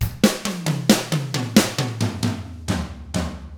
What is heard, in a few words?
SLOW ROCK, Drums; ILIO; Double Platinum Drums 1